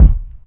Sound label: classic hip hop kick; Classic Hip Hop Mega Kit